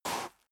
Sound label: step boots gravel light slide short; schritte